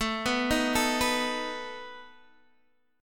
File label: A Suspended 2nd Flat 5th A down Guitar Open D